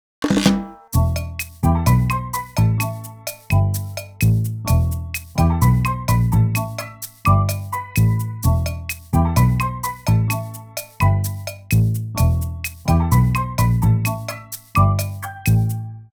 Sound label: background muzak